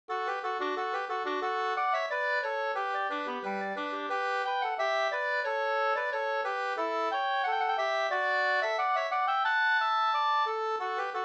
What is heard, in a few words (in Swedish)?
ganglat